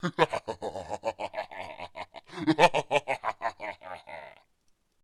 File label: Alien Predator Laugh